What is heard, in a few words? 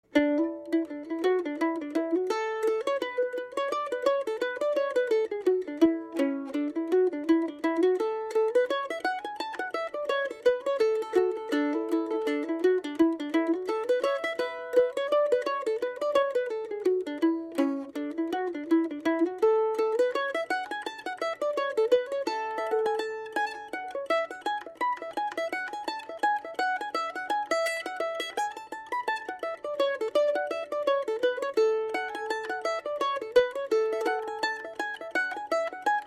Mandolin